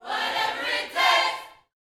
Vocal Gospel PHRASE

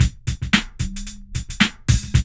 LOOP; E-Lab; X Static Goldmine 2; akai

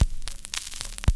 CRACK; E-Lab; X Static Goldmine 2; akai

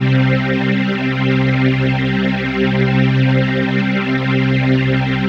PHASE PAD, ATMO PADS; Best Service; XX Large

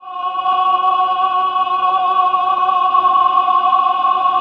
CHOIR; Best Service; Robot Computers Akai

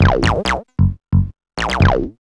B LOOP

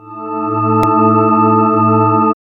SYN-FX

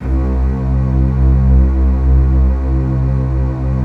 PAD, ATMO PADS; Best Service; XX Large

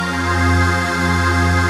VOICE PAD; Best Service; XX Large; Pads

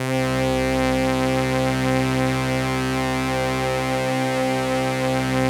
Atmo Pads; Best Service; XX Large

